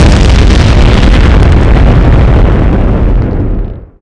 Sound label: big explosion